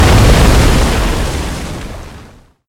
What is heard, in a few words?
wind gust